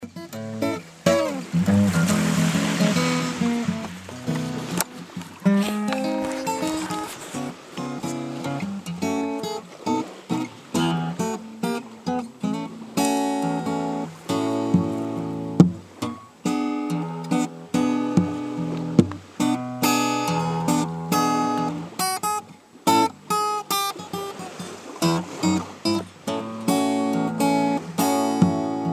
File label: guitar; crimea